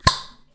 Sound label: Open Jar Plopp